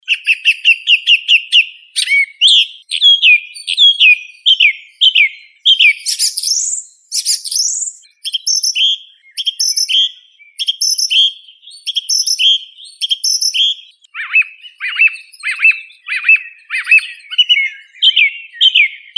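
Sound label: song thrush, turdus philomelos; drozd pevchii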